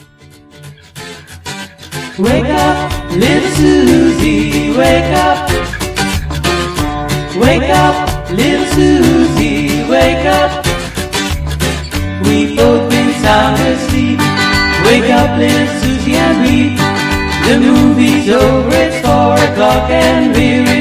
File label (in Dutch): met 2e stem zang